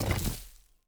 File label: pick rock; Rust